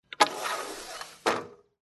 zvuki dverei

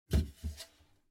zvuki sidenia dlia unitaza

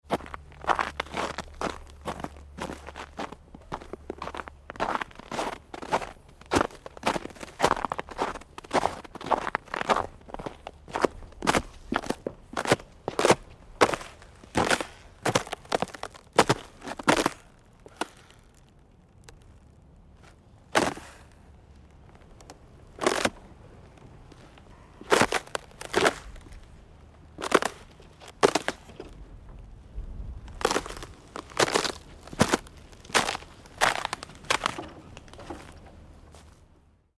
zvuki shagov po ldu

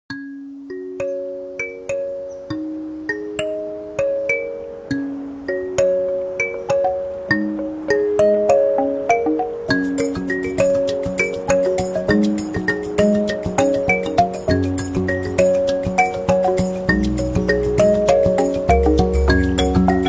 originalnye ringtony i uvedomleniia nokia 808

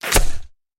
zvuki khedshot

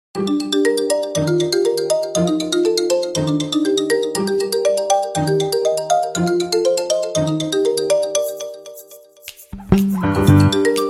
originalnye ringtony i uvedomleniia nokia 808

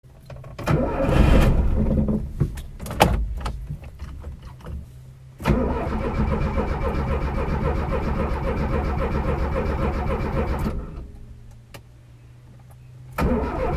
zvuki nivy

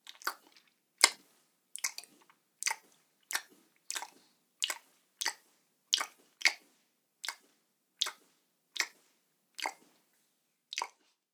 zvuki gubnoi pomady

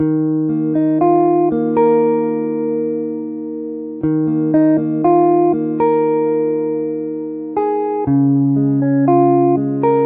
zatsiklennye melodii dlia gitary loop

